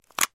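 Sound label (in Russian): звуки губной помады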